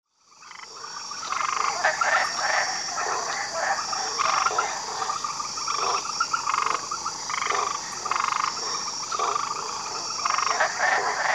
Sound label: frogs and insects jungle